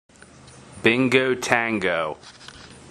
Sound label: Bingo Tango